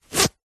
foley clothing jeans denim pants trousers fly zipper; zapsplat